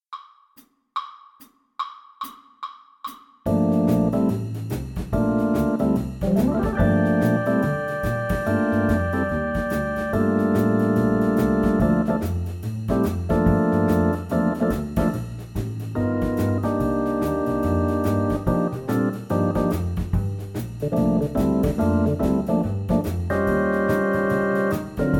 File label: Trumpet Playalong SHUFFLE, Jazz; Trumpet Etude A-1 Shuffle Track